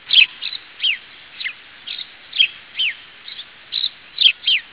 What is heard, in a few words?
house sparrow, birds